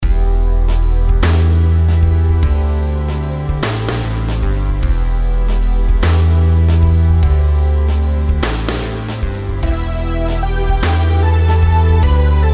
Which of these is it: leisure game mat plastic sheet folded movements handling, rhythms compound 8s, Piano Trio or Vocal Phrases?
rhythms compound 8s